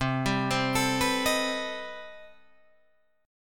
C Minor Major 13th C down Guitar DADGAD

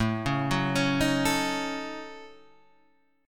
D sharp Minor 7th Flat 5th A

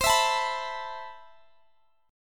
E Suspended 4th C; Esus/C; strummed

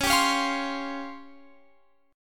C sharp Major 7th Flat 5th Guitar Open F; C#M7b5; strummed

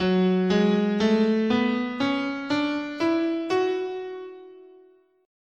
F sharp Aeolian, scale

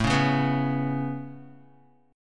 A Major Add 9th A Guitar, chord

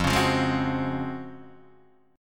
Db Major 11th F

